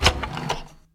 page Switched; wildotron 3000

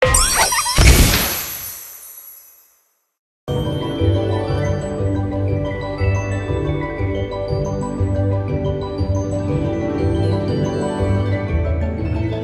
bonus game; fairyred

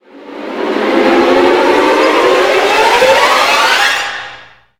knife Trans First Exite; hellskitchen

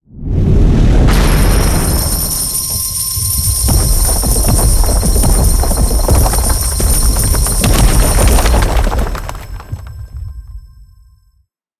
yellow flame; hiddencoinsofegypt

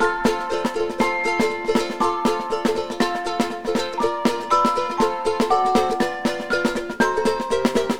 free spin intro splash loop; santa vs rudolf

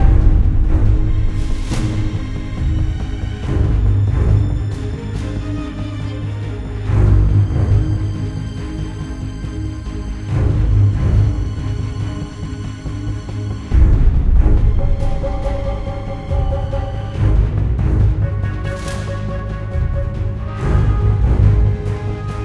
intensity; arcane

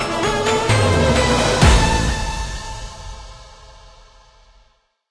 outro free spin; wild worlds

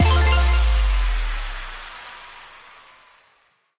big Win End; twin happiness

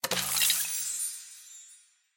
bonus enabled; rise of maya

